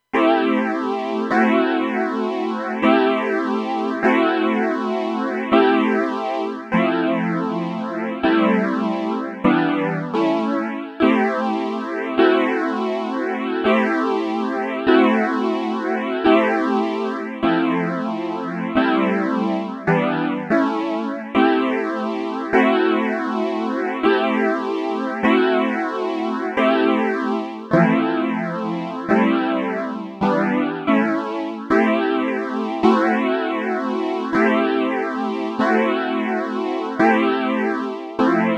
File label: chords play, reface cs